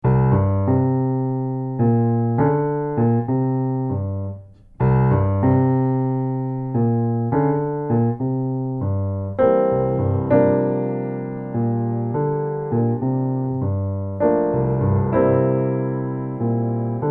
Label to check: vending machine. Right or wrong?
Wrong